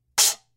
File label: K Custom Splash Choke Crash, cymbal